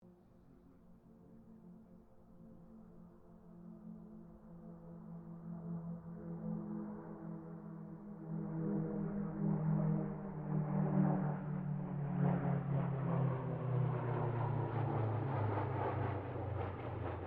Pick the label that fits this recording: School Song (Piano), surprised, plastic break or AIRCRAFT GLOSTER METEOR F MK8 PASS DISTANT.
AIRCRAFT GLOSTER METEOR F MK8 PASS DISTANT